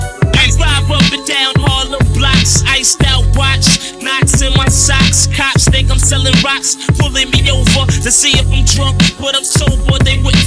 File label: beat